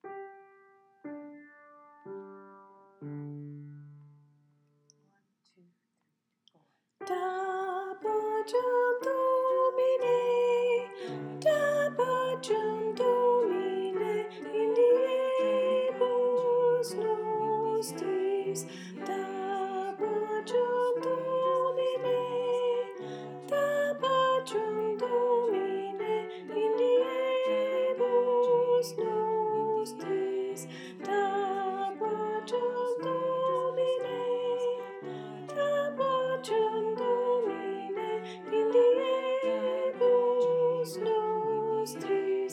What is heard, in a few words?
Soprano